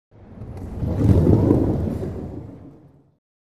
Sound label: Bowling Ball Rolls